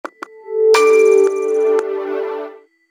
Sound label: MELODY